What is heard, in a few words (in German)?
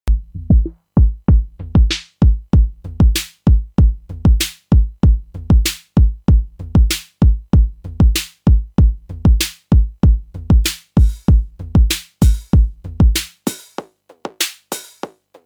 TR8 MX1 Bumm Bumm Tschak BFX MFX Filter